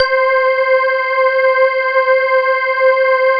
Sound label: CUSTOM C5, CUSTOM ORGAN